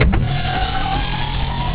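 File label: elevator; duke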